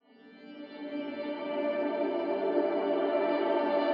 122 BPM Pads Amaj Gmin Tonic G, ambient synth pads; MMB